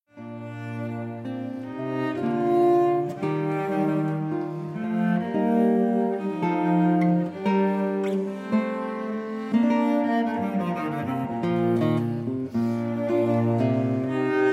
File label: Cello Consort Largo; Italian